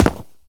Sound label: concrete fall; Golden Crayon Sounds